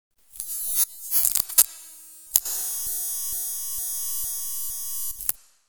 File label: Electronic Insect Droid Destruct